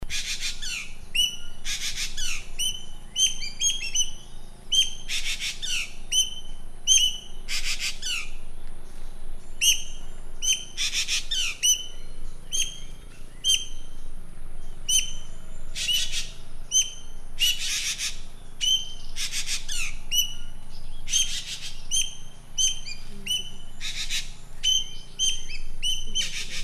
suara burung ekek layongan atau cissa chinensis

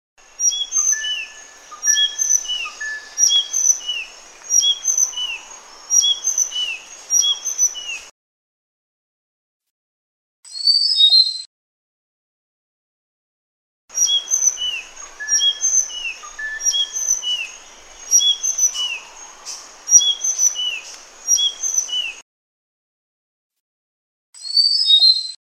suara burung sikatan sunda

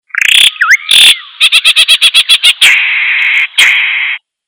burung asing

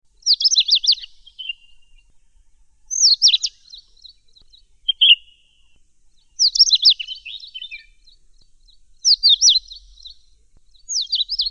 kacer india

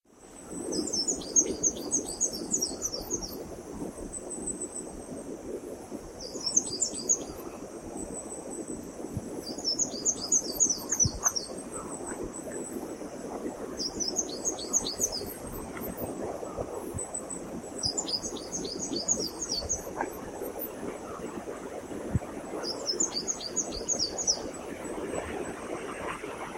burung madu hitam

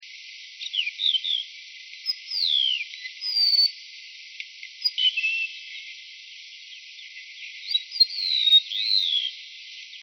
remetuk laut

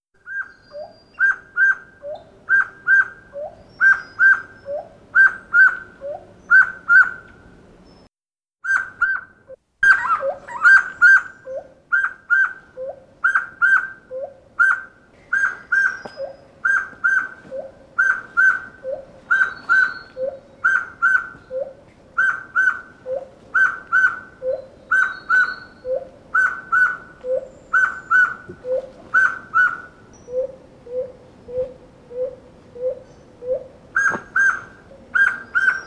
poksay hitam

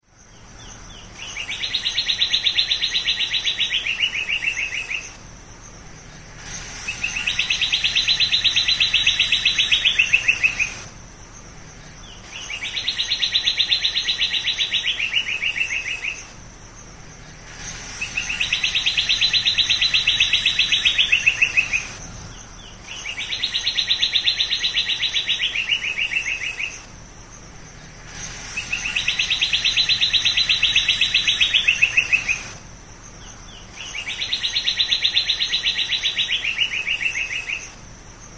kicauan burung kapasan kemiri

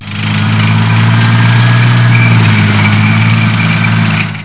suara mobil

